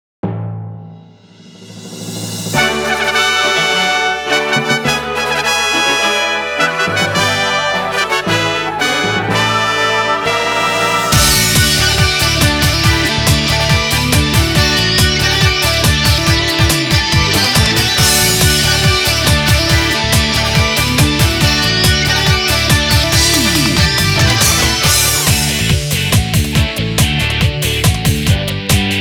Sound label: [Karaoke Version]